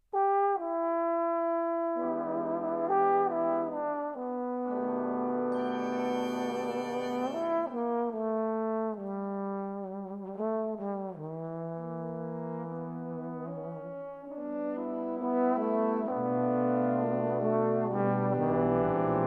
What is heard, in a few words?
brass